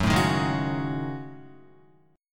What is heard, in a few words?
Gb Minor 6th Add 9th Gb down Guitar; strummed; JGuitar